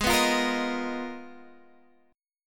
G sharp Minor 6th Add 9th, Guitar Standard; strummed; x,11,9,10,11,11

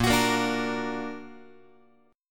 Bb Augmented Bb Guitar; strummed; JGuitar

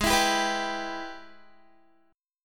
Ab Minor Major 7th Sharp 5th, down, Guitar; strummed; AbmM7#5

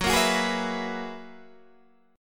Gb 11th, Guitar, DADGAD

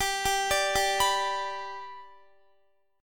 G Major, Guitar; arpeggiated; x,22,19,19,19,19; 4F,4A,5C,5G,5C,6E

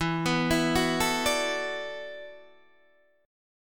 E 9th Suspended 4th E down Guitar Open D

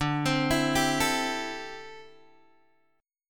D 7th Suspended 2nd Suspended 4th, Guitar, Open G

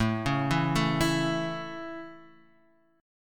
A Major, Guitar, Half Step Down

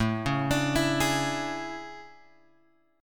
A Major 11th, guitar; AM11 Chord {5 4 X 7 5 4} arpeggiated

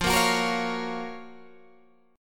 Gb Major 7th F, guitar

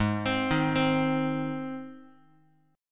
Ab Major Ab, Guitar; arpeggiated; x,4,3,1,4,x; JGuitar

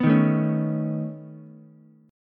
Db 7th Suspended 2nd Sharp 5th Db, down, Ukulele; strummed; JGuitar

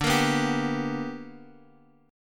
B-Minor Major 7th Sharp 5th D

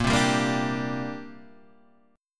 D 7th Suspended 4th Sharp 5th, chord

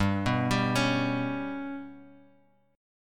G Major Flat 5th Guitar Open F, chord; GMb5 arpeggiated; JGuitar

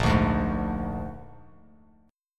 A sharp 7th A sharp, Bass Standard; A#7 strummed; JGuitar

